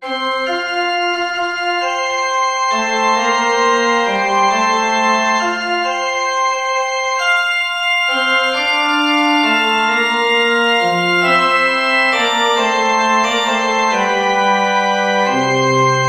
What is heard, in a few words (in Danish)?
forspil; velkommen igen; weyse